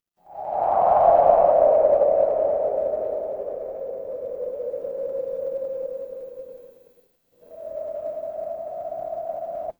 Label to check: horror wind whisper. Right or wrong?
Right